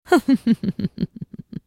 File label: Ghost Bride laugh; Morgana; Character Voices; English